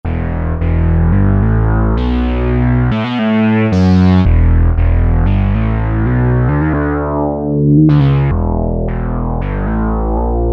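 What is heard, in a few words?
Bass Taurus Bass Pedals, Alesis Micron; Factory Programs; TaurusBassPdls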